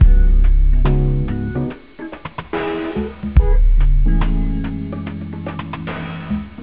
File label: funk; OctaMED SoundStudio V1